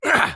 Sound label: grunt